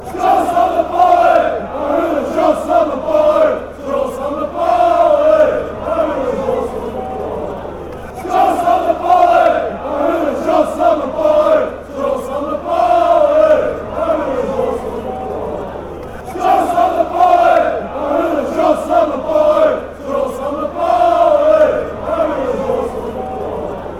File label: chant; beerschot